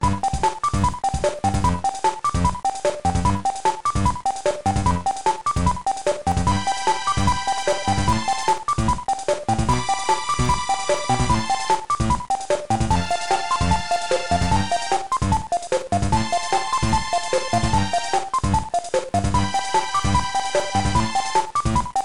Sneeze; DM&PL

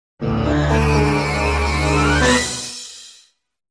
Jingle; SM64; Bowser's message

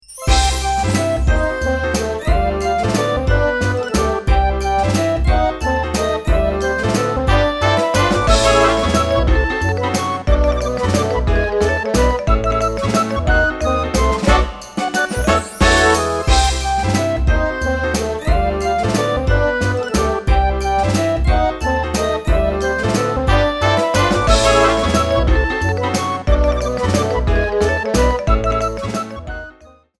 "Success!" theme; MP9

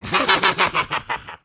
Laugh; MLDT; Antasma's

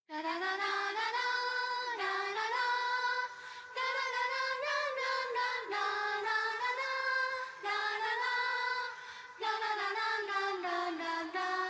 Acappella

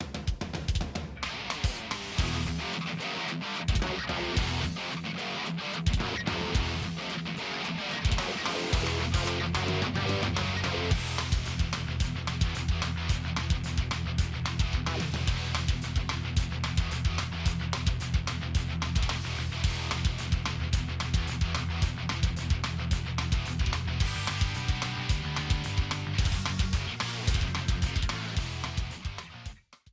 Boss Fight Thinking

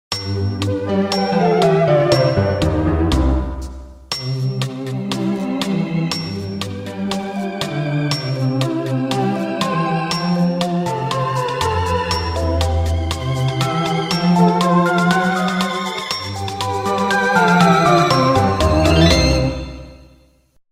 Boo and the Piano

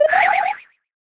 Sound effect of Spin Jump

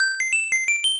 1-Up jingle, PC-88; SMBS

